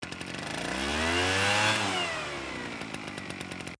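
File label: LEAF BLOWER, residential; zones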